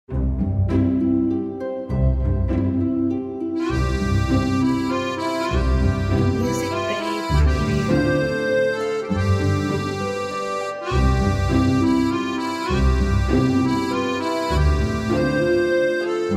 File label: Scenic French Cafe